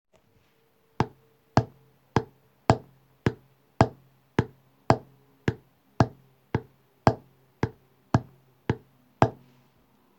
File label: rhythm